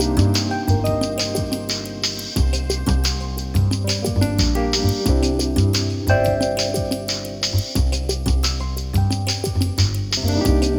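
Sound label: Music Draw Loop; Pictionary; NDS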